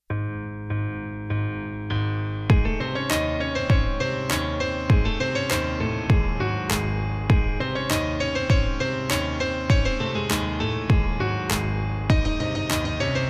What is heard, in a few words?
Piano Cover